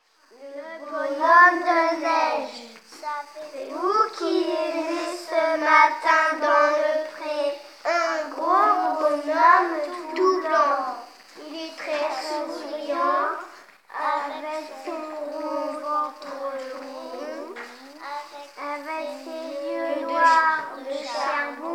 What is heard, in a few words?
Vocal